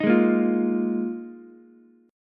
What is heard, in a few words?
D sharp Suspended 2nd Suspended 4th, Ukulele; strummed; JGuitar